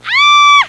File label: FEMALE, PEOPLE; Easy Personal Web Page